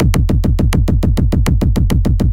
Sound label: loop oerlikon bass, guns tanks